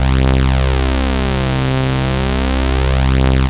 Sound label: Bass Note C